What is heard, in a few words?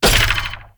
p atk magicsword